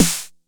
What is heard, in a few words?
Snare 909 Tune